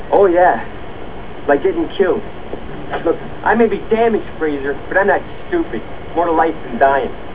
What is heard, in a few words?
dying